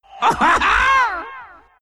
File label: laugh; Bane